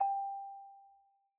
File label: Xylophone Soft G4 pp far, Soft Mallets